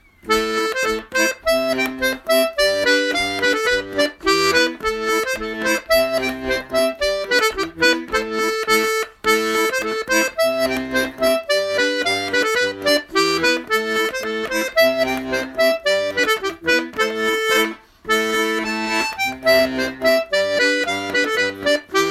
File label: polka; Accordéon Diatonique